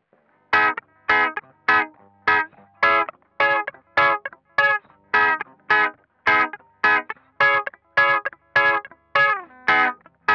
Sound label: guitar; sonic